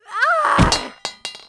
Paladin Dead

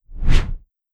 Swoosh Low

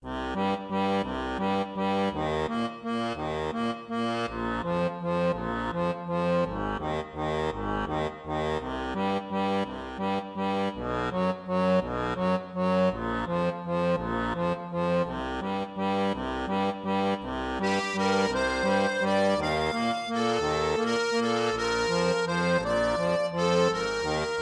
tavern loop; paris-tavern-loop3; curious expedition 2 macos ps4 switch windows xbox one xbox series xs gamerip 2021

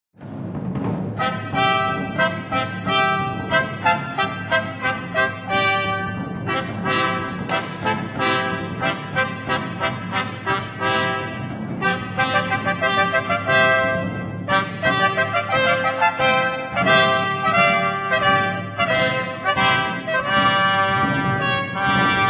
Goals Complete; Buildalot

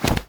Land On Ground; Hollow Knight; Mantis Lord Land On Ground

